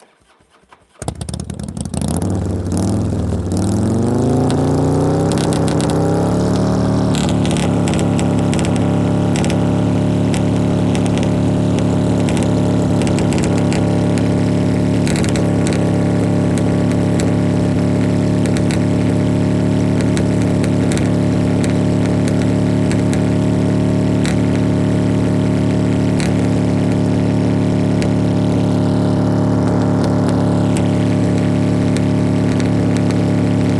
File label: motorcycle fatboy straight pipes tuned engine pipe side throttling high; anarchy; 100year; sonniss gdc